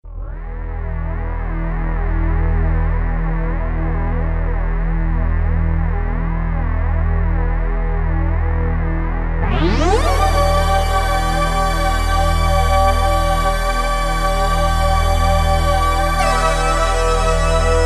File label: Sus II portamento solo, yamahacs80